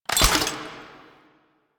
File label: Sniper Alt Fire Mech React; DE; Warframe; Frumentarius; Exalted Sniper